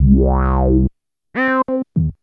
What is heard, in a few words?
drum n bass; FUTURIST; Sony; ACID Pro 6.0